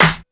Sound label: snare; PlatinumPhattCD1; E-MU Producer Series Vol. 8 – Platinum Phatt